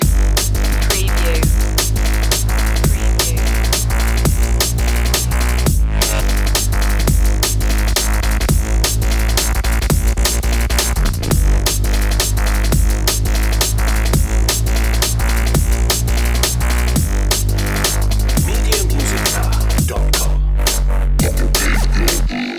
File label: subharmonic panic loop